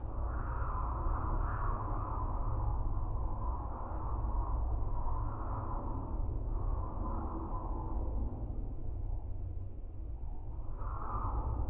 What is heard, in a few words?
AMB dust; Star Trek